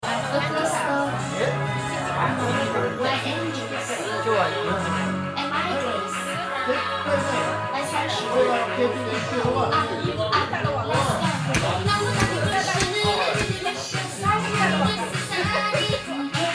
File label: karaoke ambiance; FUKUSHIMA